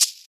clean shaker sample F key, Royality free shaker sample tuned to the F note; percussion